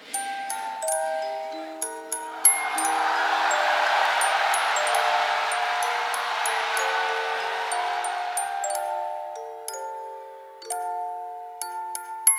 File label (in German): Orgel